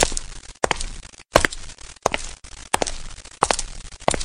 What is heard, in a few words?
walking